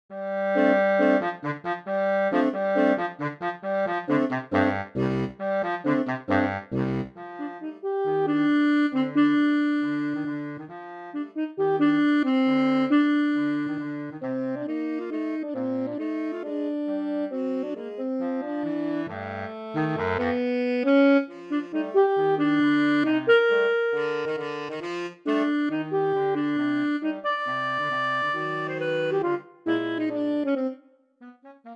Black Bottom; SCHULHOFF; Six Jazz Scenes; Esquisses de Jazz - Jazz Scenes; Saxophone Quartet

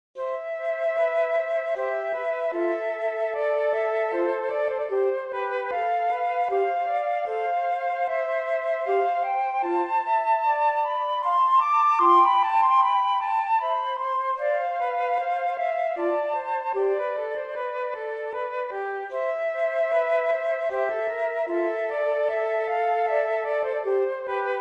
Danse Noble Flute Trio

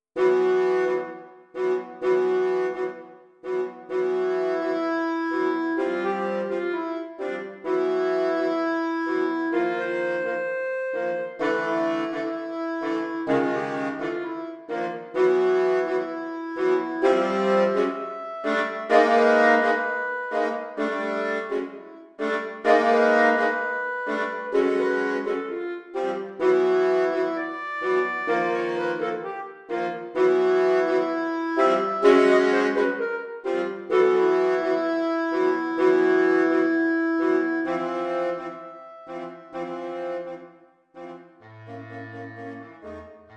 Tango, saxpress; SCHULHOFF; Six Jazz Scenes; Esquisses de Jazz - Jazz Scenes; Saxophone Quartet

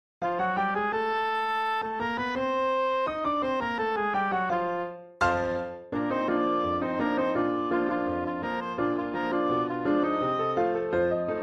Ragtime Dance Intro Theme A

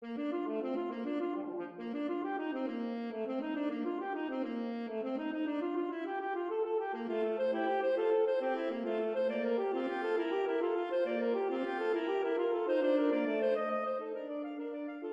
Duet Presto; WF Bach; Saxophone Duet

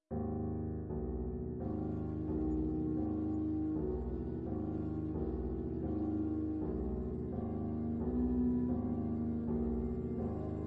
Flute Quartet w Piano; Bydlo; Pictures At An Exhibition

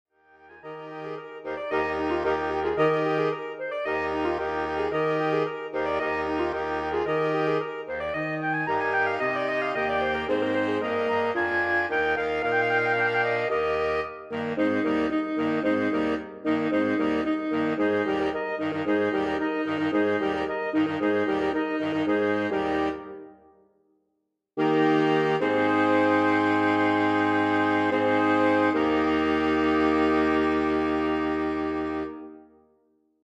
Halleluja Chorus Quintet Ending; Handel

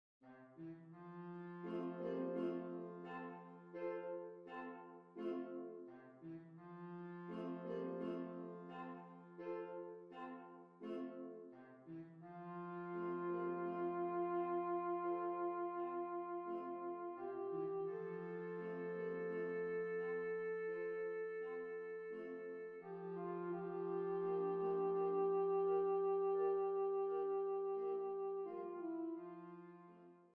Humming Chorus; Puccini

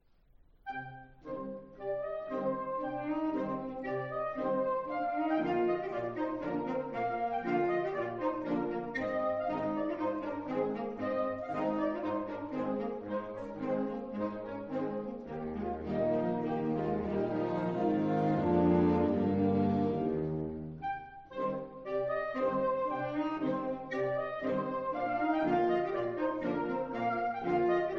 Slavonic Dance saxes; DVORAK; No 7; Large Ensemble

